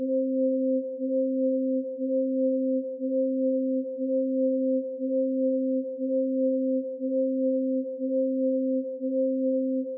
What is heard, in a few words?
Separated Horn